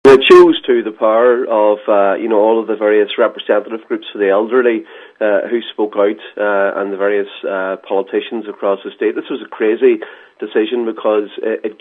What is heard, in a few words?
pad